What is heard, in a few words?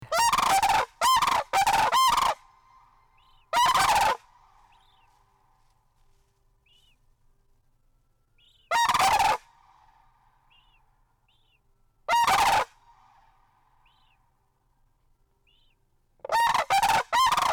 Unison call; A Fledge for Freedom